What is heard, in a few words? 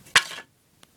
Dropping Wood